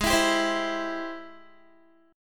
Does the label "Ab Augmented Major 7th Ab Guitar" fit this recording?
Yes